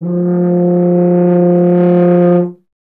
BRS TUBA, Solo Instruments

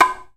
OHKAWA, JAPANESE PERCUSSION; AKAI S6000 CD-ROM - Volume 5; Ethic

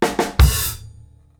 KICK SNAR, Live Snares; PlatinumPhattCD1; E-MU Producer Series Vol. 8 – Platinum Phatt

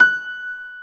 F#5, GRAND PIANO; InVision Interactive; Lightware VOL-1; Instruments & Percussions

